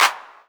hand clap sound c key, percussion single hits one shots